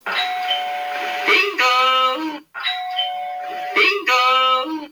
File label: ding dong